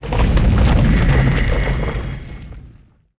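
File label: boss Sphinx death; monster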